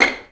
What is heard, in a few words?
VI PIZZ C6; Best Service; Peter Siedlaczek's Advanced Orchestra